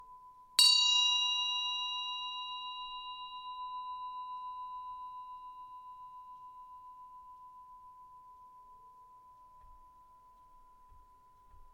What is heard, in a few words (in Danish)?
Klokke; Klokke XL